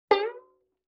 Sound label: Violin Pluck Pitch Rise; Vintage Cartoon; Musical Elements